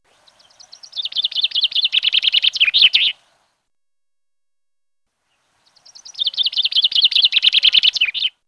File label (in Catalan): pinsa